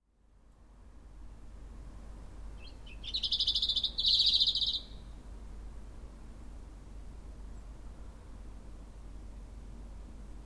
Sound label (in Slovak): červienka